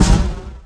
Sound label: land metal future, terrains; Tony Hawk's Project 8; XBOX